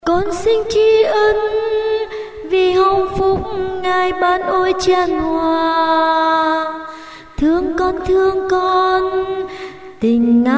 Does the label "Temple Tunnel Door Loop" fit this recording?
No